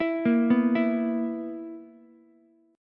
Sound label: B Major 7th Suspended 4th B down Ukulele; BM7sus4; arpeggiated